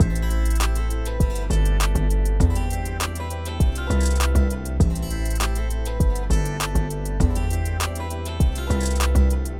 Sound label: hiphop